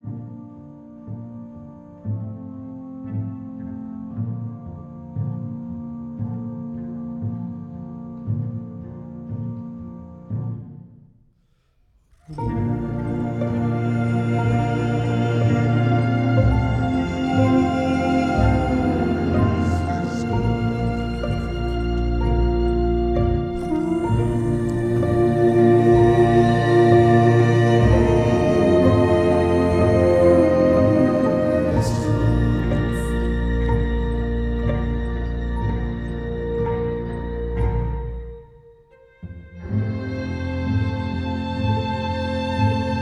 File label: MUSIC MIX